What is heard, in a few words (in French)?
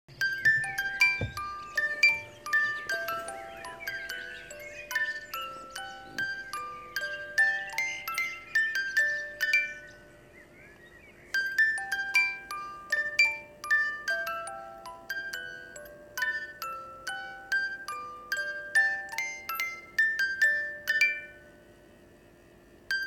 Musique boite à musique - boite a musique